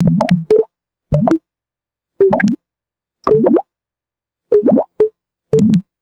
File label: short hollow digital notification bloops; ui